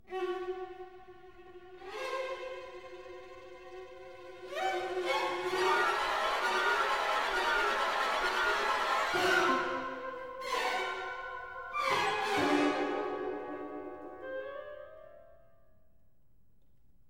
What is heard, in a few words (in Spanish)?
Música Danza del pájaro de fuego